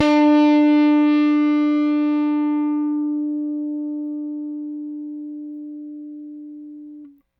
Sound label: RickThumb-C, RickenbackerBass